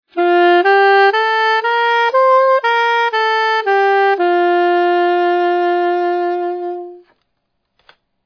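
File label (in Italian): scala staccato legato, sax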